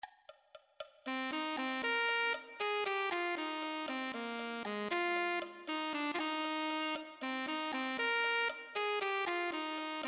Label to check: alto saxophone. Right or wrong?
Right